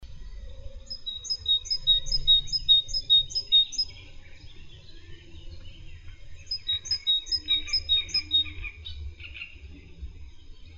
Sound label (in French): Chant et cris Mésange charbonnière